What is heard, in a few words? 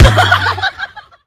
drum hit finish; osu